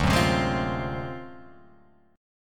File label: D 7th Sharp 9th Flat 5th, Guitar Open F; D7#9b5 strummed; JGuitar